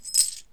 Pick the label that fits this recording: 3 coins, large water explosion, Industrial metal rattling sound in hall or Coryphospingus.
3 coins